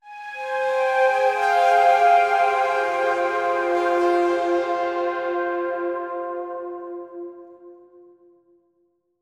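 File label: Synth F minor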